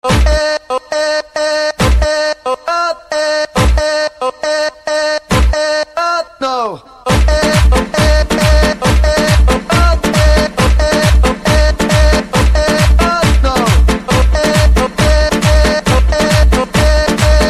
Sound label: Dhol